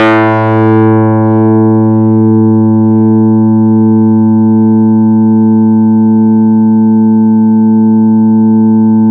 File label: MonophBass a3, Yamaha YX81Z